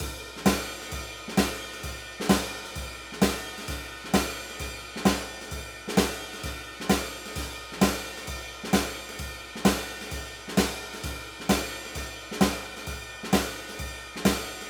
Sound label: Shuffle